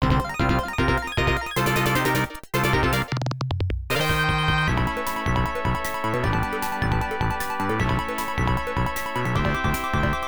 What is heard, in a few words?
Stage Clear; Nectaris; IBM PC AT